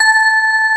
FM PC BELLS

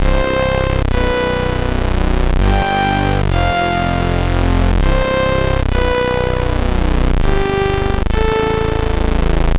C64 Synth

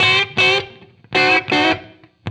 BLUES GUITAR

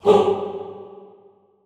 HOO G2, Classical Choir, SHORT HOO; Best Service; Extended Classical Choir

